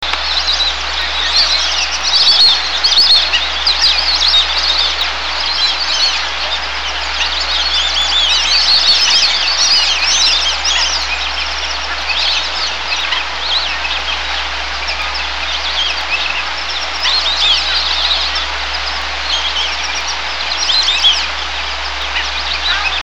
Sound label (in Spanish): siri pampa, Dendrocygna viduata, White- faced Whistling- Duck; cantos; Sirirí Pampa